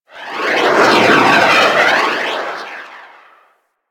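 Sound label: Mortar Projectile Incoming; Warframe; Venus; Venus Terra Mortar Moa; Abilities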